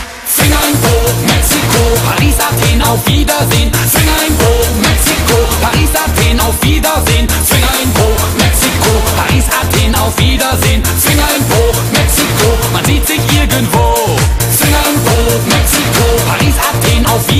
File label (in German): musik; jail old